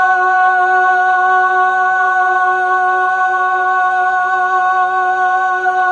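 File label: Fem F sharp 2, Mellotron Female Choir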